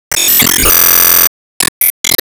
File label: Wave Clear Bonus